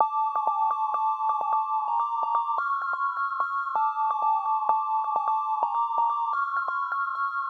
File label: lead; whisper